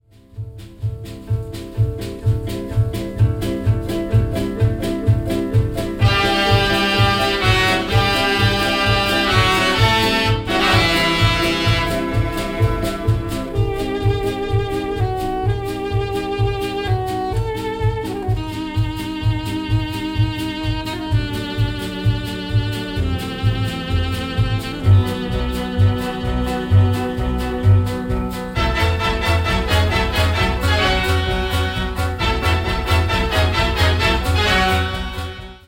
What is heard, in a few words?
instrumental